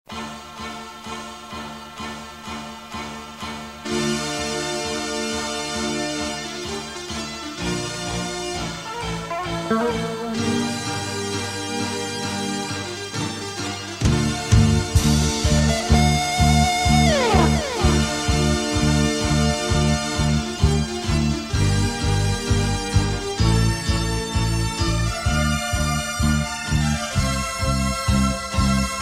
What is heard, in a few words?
HAVY MARCH